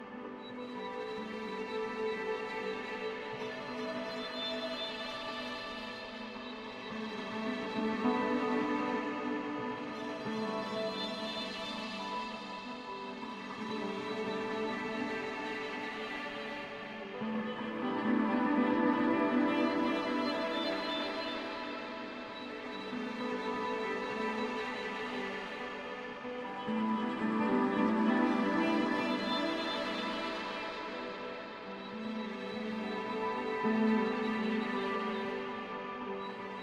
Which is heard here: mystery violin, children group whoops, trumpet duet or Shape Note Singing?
mystery violin